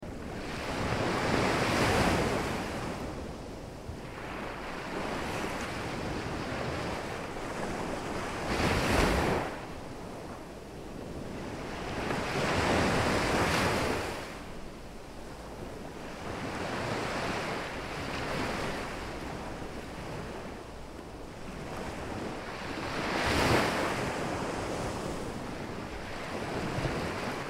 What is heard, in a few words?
beach